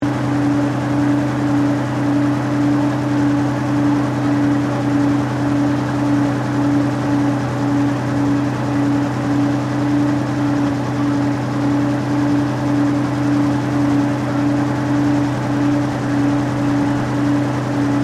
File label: TANK CENTURION PRIMARY ENGINE IDLE FRONT, British Centurian main battle tank; Trucks (Sound Effects:Vehicles); SoundEffects-Foundation4